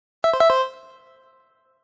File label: Correct